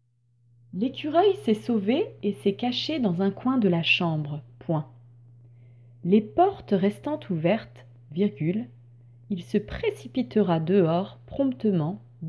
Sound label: Vocal